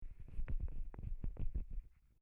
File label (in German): Hand Audix OM6